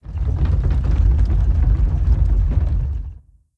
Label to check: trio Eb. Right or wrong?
Wrong